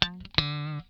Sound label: LICK SCALE, Funk Guitar; Zero G - Funk Guitar